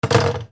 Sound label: PHONES